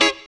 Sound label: GTR; AMG; Now CD-ROM (Roland)